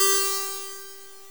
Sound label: guitar G3; osu